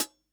ClHat, drum

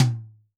Tom, drum

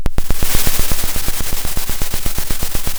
pop, Sound of a drum roll

